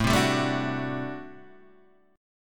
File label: A Minor 13th, Guitar, Standard; Am13 strummed; JGuitar